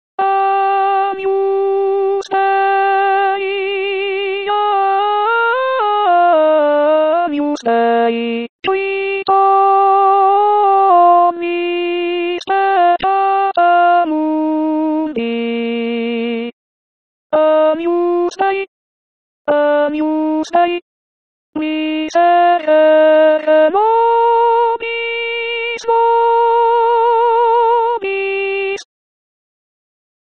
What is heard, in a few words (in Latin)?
Agnus Missa pro pace ALTI